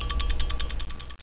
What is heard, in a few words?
tickatick; MultiTimer 2.0.2